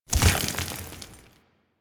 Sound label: Horse Surface Kickup Dirt; Vehicles; Duviri; Steps